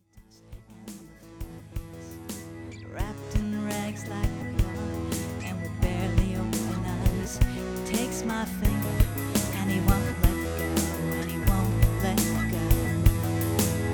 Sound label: Vox; Adoration